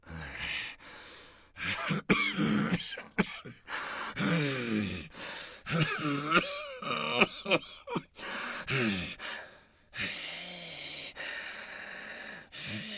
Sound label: breath death; Escape from Tarkov